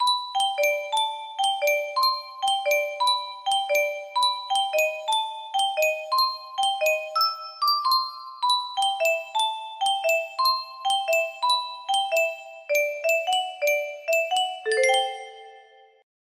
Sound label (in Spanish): caja de musica